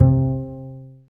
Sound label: STR, STRINGS; Roland - LA Composer vol. 1